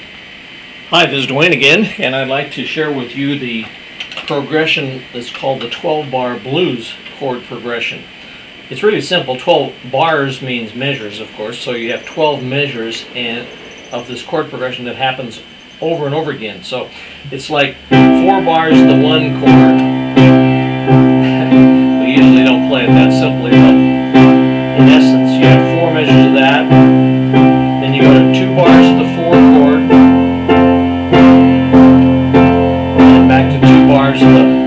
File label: 12 Bar Blues; Chord Secrets